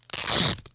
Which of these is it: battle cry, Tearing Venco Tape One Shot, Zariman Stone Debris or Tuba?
Tearing Venco Tape One Shot